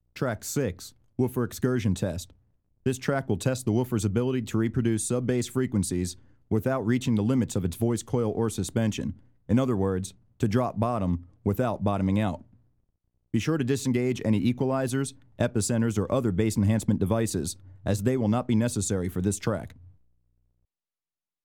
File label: Subwoofer excursion test